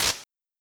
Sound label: Clap, Fricke MFB 502